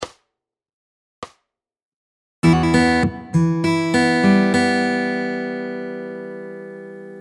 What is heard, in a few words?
Fingerpicking Lick; Fingerpicking Fun